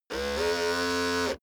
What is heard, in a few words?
servo steady